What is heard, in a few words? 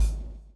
BLOW; Spectrasonics - Backbeat